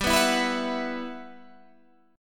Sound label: E Suspended 2nd G; Esus2/G strummed